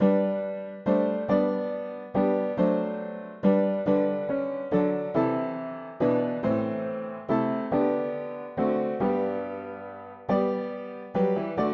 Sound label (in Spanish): mono piano; hymns; Himnario pequeno 2Pte